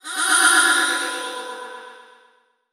Transition Delay Medium

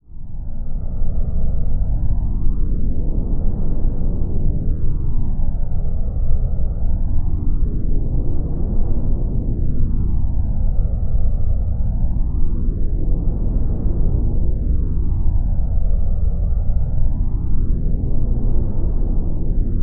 Drone Deep Strings Tonal Phase; Production Element Imaging; AdobeCC

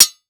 Weapon Sword Hit Metal Strong; AdobeCC

